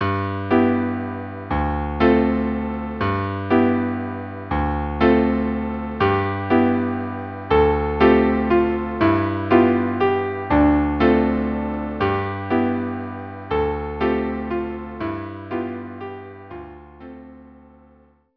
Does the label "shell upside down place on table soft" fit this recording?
No